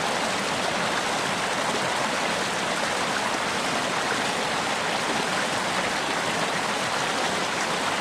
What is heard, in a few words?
River